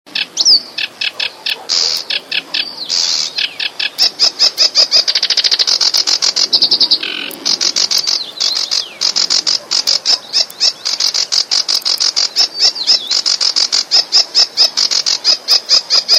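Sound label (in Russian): kamyshovka barsuchok, Sedge Warbler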